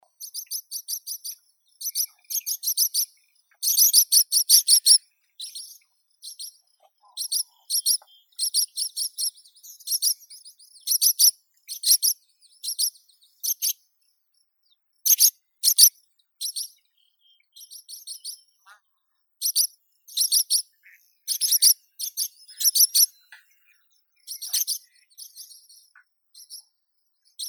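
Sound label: barn swallow, Hirundo rustica; lastochka derevenskaya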